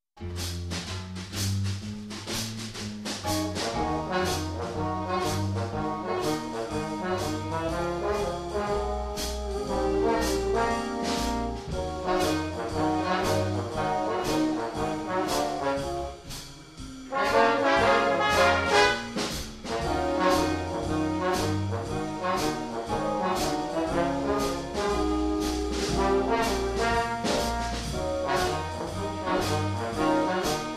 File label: Swing Jazz Combo; Premier